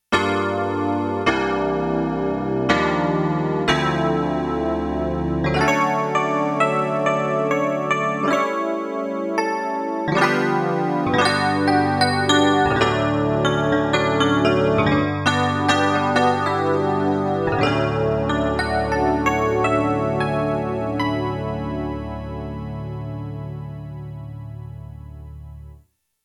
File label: E PIANO, Roland JX-1; Factory presets